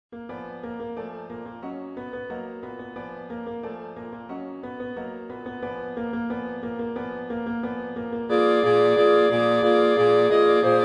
3rd Chorus Entrance Tutti; Act I Finale; First Section; grandduke

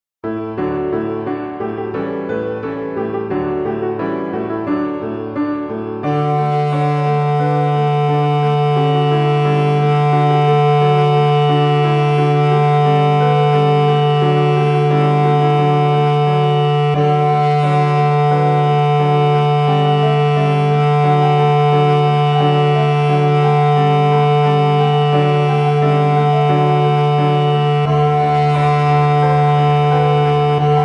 Bass; yeomen; Heighdy

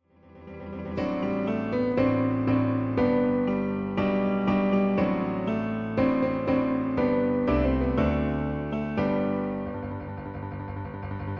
Tutti; Who Registered His Crown And Country; Utopia

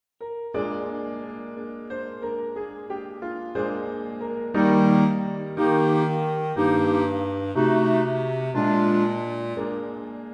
Chorus Excerpt Tutti; A Nice Dilemma; Trial by Jury

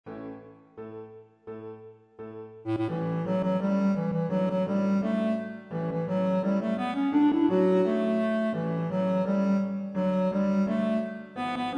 Chorus Entrance Tutti; When First My Old Old Love I Knew; Trial by Jury

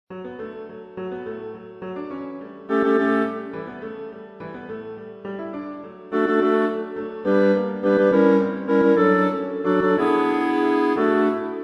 Chorus Entrance Tutti; When First My Old Old Love I Knew; Trial by Jury

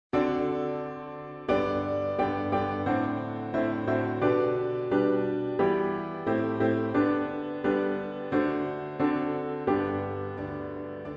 Chorus Cue Tutti; I Hear The Soft Note

